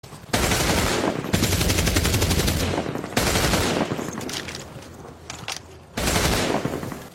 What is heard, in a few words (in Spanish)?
metralleta